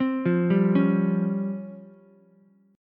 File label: Db Major 7th Flat 5th, Ukulele; DbM7b5 arpeggiated; JGuitar